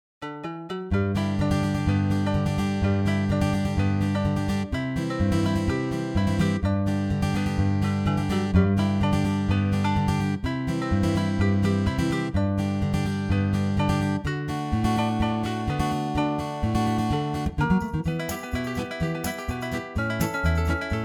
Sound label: Bluegrass, Strum Acoustic